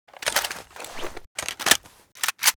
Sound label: scar reload; STALKER